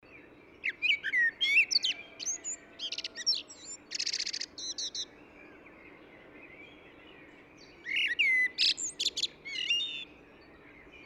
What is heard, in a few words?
Eurasian Blackbird